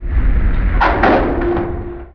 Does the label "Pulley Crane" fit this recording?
Yes